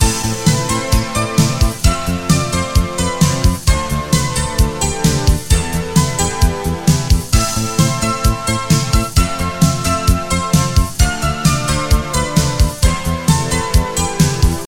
techno

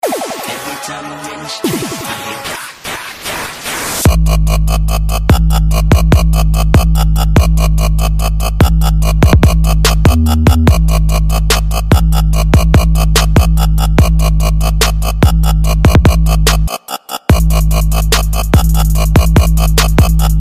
trap

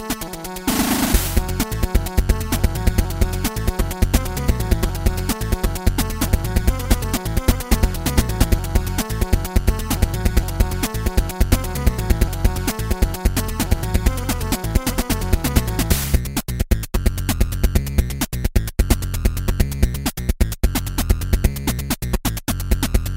8 bit; Tekken Jin

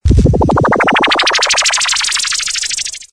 Laser Water

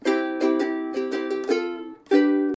Ukulele Text Tone

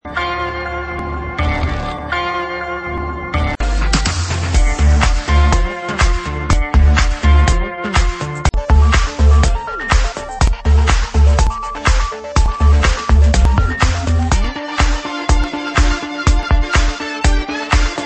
Bgm

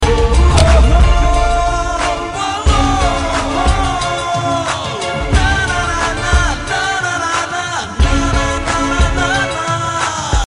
remix vocal; rock

